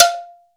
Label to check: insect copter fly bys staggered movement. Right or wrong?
Wrong